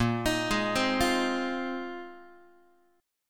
Bb 9th Suspended 4th Bb down Guitar; x,6,6,6,4,4; 4A,4E,5A,5D,5G#,6C#; arpeggiated

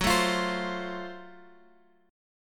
Gb Minor Major 7th Flat 5th Gb Guitar Open G; down; chord shape

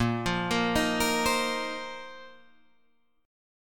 Bb Suspended 2nd Suspended 4th Bb Guitar Open G; arpeggiated

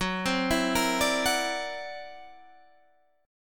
C 9th Flat 5th F sharp

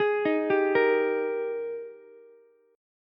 Ab Major 7th Suspended 2nd Ab Ukulele; AbM7sus2; arpeggiated; chordshape; 13,15,15,13; JGuitar

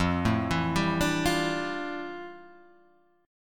F Major 13th Guitar Drop D

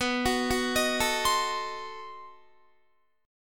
B 7th Flat 9th B Guitar; B7b9; arpeggiated

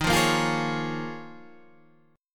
Eb 7th Suspended 2nd Suspended 4th Eb

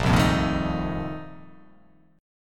A sharp Major 7th Suspended 2nd Suspended 4th

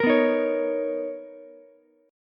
B Major 9th B Ukulele C6; BM9; strummed; 15,11,11,16

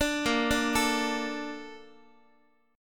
A sharp Major 7th, Guitar; arpeggiated

